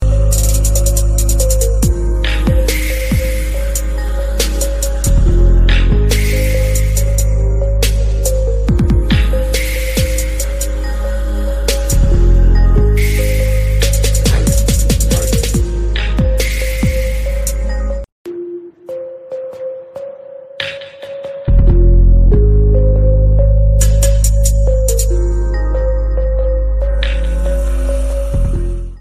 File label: Samsung Ringtone